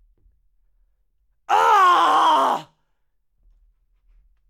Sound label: male death